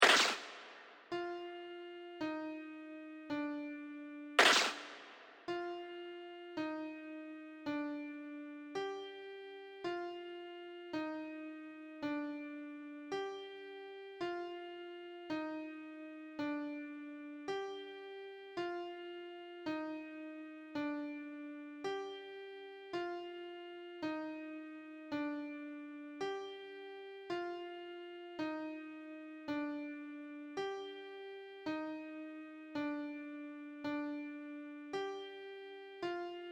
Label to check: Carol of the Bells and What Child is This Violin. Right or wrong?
Right